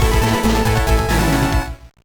Enemy Deleted; Rockman EXE 4.5 Real Operation; GBA

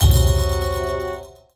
Negative Jingle; Avatar The Last Airbender The Burning Earth; ps2 wii xbox 360

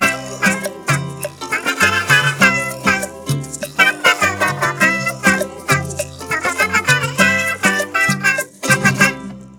Ukulele (Slow)

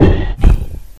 death, Voices; Gromila

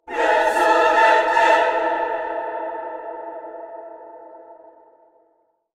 Minigame Success; Stacey

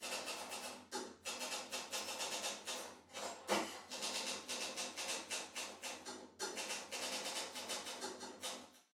Ambience Typing; Deployment

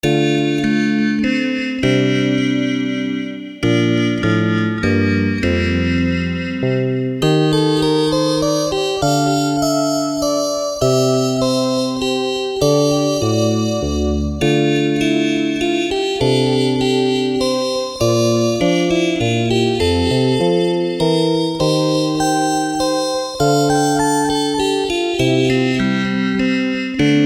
Music Box of Sadness; Gin Faints; lagrange point soundtracks returns

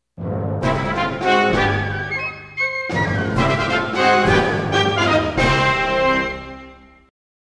ice climbers' victory; Dairantou Smash Brothers DX

